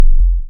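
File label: waveform FM sine triangle envelope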